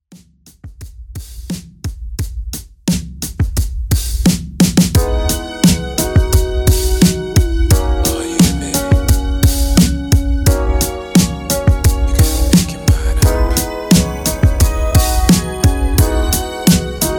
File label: Rmx 87Bpm; Redrum; remixplanet